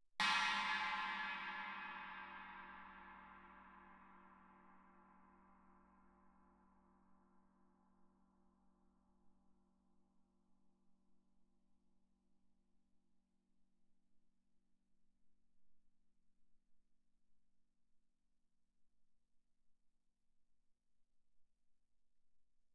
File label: chinese stick shoulder mf, suspended cymbals; Percussion